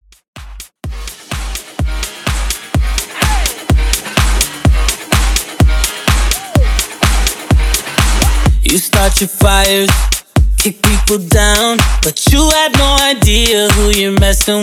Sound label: Top40 No Hype 126 BPM; Redrum